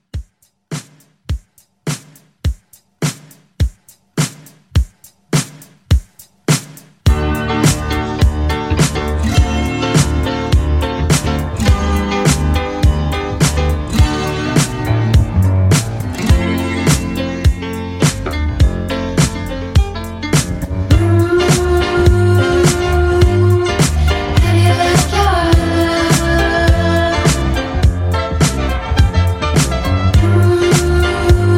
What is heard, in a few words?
70s Disco